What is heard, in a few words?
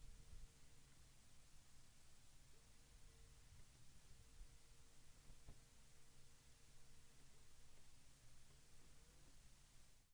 Vending machine Dollar Rejected Whirr Mech Security Camera turret